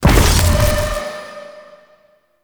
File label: Ground Spike Hit player; Enemies; Abilities; Warframe; Zariman; Void Angel